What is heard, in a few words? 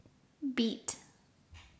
beat